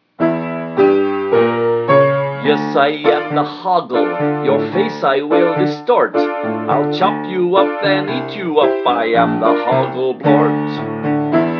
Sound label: chant